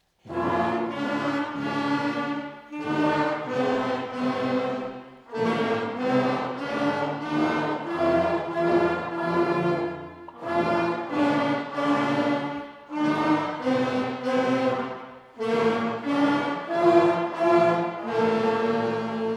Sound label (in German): Schulorchester; Haenschen klein